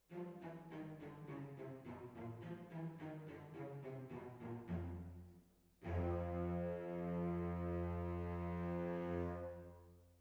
Hall

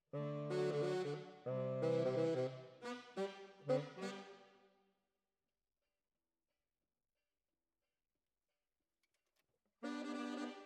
Bari, RNR for piano

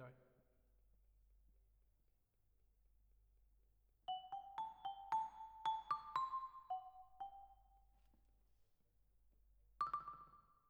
Vibra, Twin F

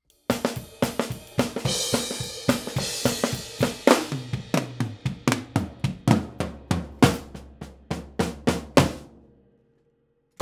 OHs

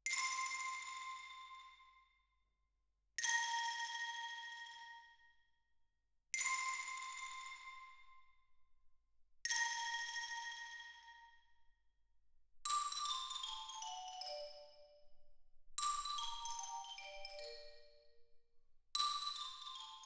Twin R Xylo

